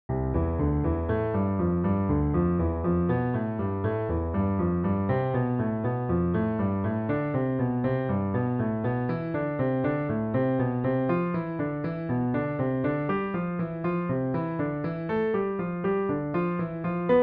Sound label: hanon exercise, piano; Hanon Exercise 16